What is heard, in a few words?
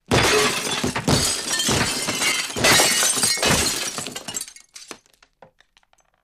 Glass Crash